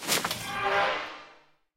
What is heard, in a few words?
Casket Exit; Deathrest Casket; rimworld